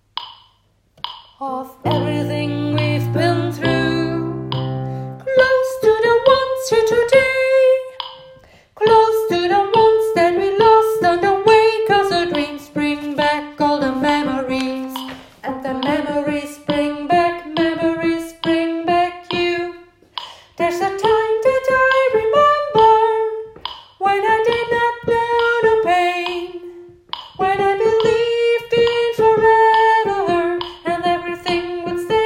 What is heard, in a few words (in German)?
Sopran